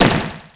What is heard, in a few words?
BALL MACH